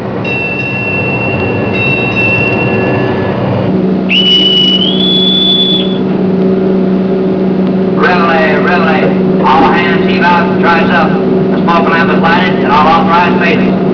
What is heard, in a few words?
reveille